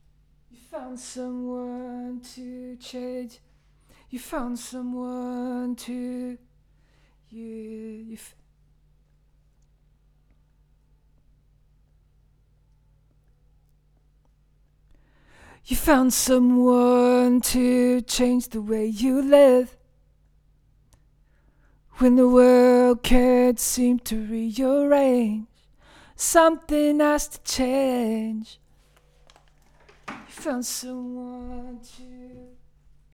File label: Vocal